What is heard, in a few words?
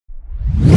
big whoosh